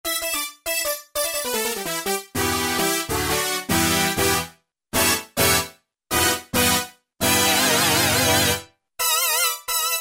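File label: Real Pop Brass, E-mu Morpheus; Factory Presets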